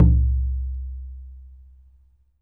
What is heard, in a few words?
POOK, KOREAN DRUMS; AKAI S6000 CD-ROM; WorldSounds